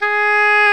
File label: Oboe; Oboe f; Roland L-CDX-03 Disk 1; Oboe 4-8